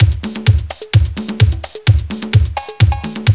breakbeat; World of Sound; instruments; beats

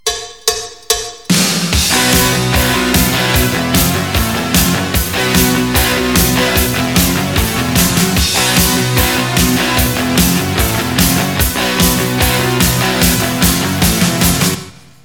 ROCK; L'Effet Pommier - Volume 04; MUSIC

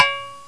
Clink-Klank

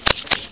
shot load; Pamela Anderson Interactive

